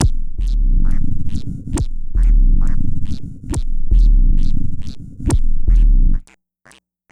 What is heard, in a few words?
Synth Bass; Bass X